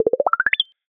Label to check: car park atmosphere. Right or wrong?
Wrong